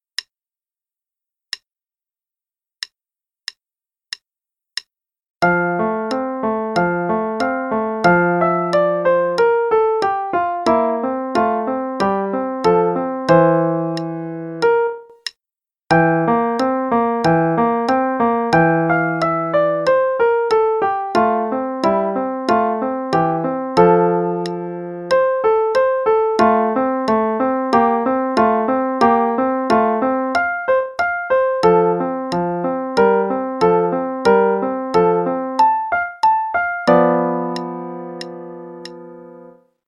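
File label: Play-a-long, Sonatina in F, eighth note=92; Beethoven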